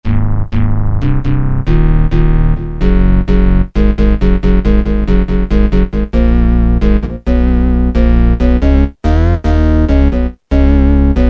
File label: Ace Bass; Roland M-DC1